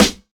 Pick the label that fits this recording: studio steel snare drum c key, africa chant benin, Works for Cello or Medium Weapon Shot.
studio steel snare drum c key